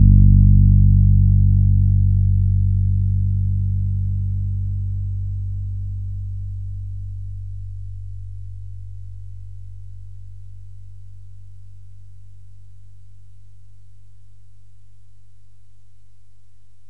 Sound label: D string low